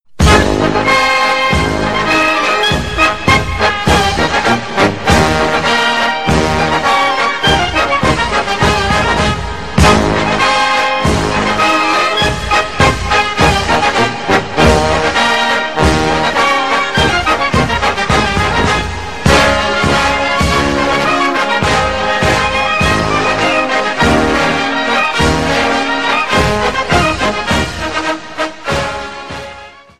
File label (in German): bayerischer defiliermarsch klingelton, bayern blasmusik